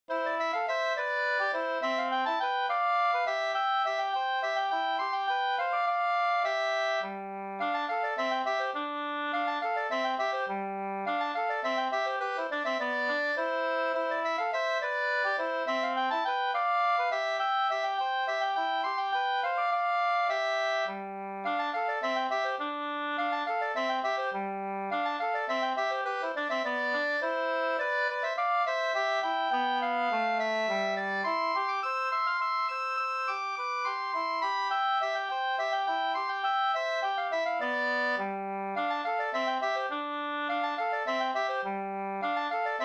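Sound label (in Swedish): slangpolska, two-part; Norrland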